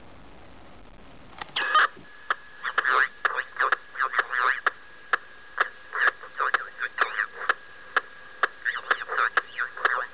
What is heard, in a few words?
Selectone ST-25 Rolling Code